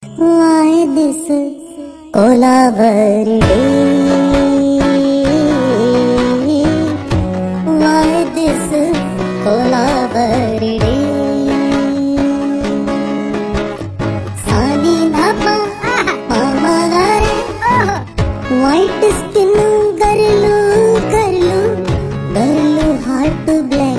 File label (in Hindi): Remake Hindi Comedy Indian Style